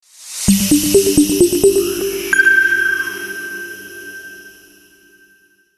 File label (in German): helium klanglogo; Messer